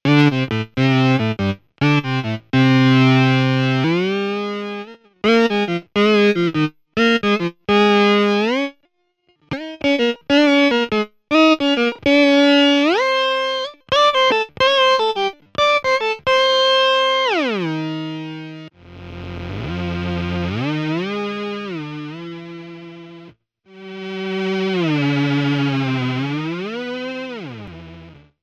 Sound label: Synth String